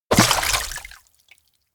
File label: shallow spear strike; anemoi